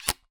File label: Camera Mirror